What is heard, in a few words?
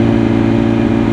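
Engines low loop; SuperBike2001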